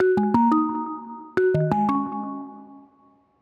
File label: Call Ring; Medal